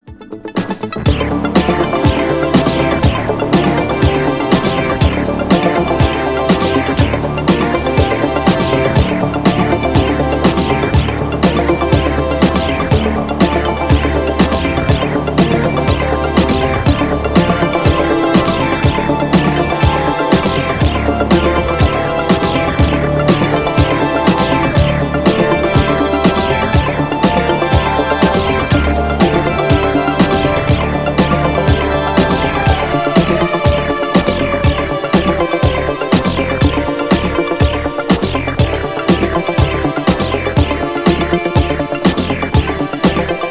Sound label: Instrumental